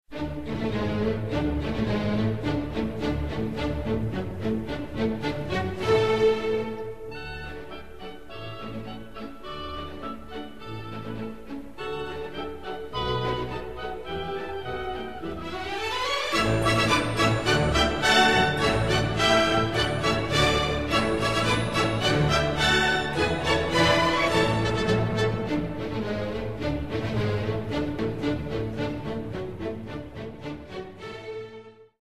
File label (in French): marche militair orchestr; schubert